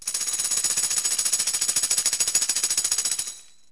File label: tamborin; music; Wired for Sound Pro CD; Aristosoft, Inc.